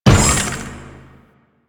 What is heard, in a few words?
Lift Move Start; Duviri